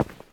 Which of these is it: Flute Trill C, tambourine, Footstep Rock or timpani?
Footstep Rock